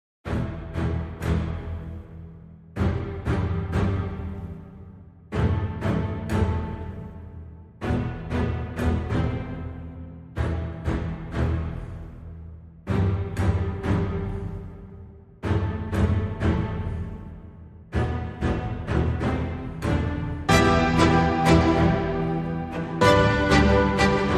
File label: Strings and Piano